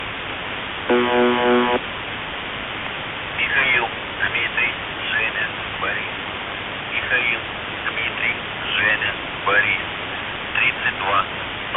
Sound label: numbers stations